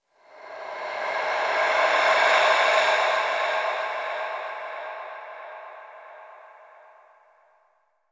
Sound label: FX